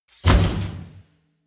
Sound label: metal crash small